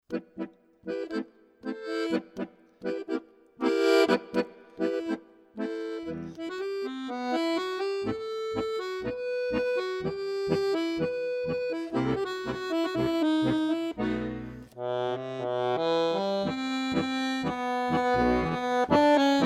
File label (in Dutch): accordeon